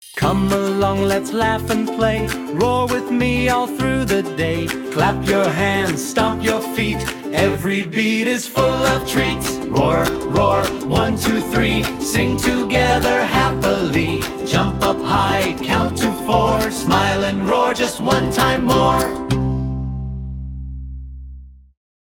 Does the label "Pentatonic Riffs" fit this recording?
No